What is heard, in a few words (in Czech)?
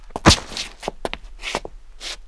kroky s ranou; Mafie 1